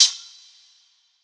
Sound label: Big Hat; Colors